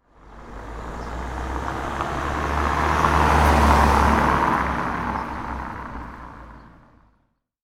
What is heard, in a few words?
Slow Car Driveby On Tiled Road